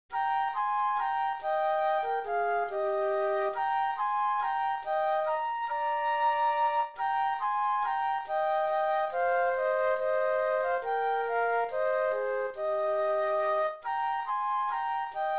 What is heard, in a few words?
Flute Duet